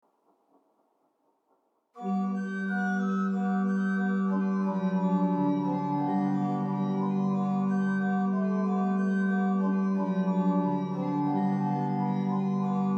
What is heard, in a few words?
Ped Hfl8 Rw Pr8 Qd8 tremulan; Haarlem; Eer zij God in onze dagen; Bw Flagf